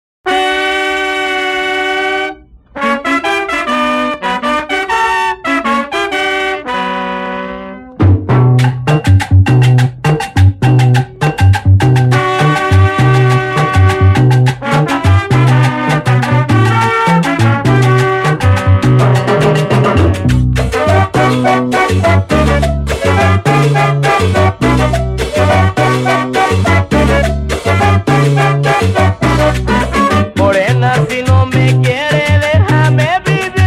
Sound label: cumbias cumbias